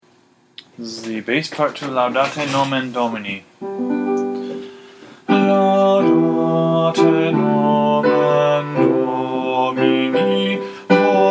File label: bass; laudate nomen domini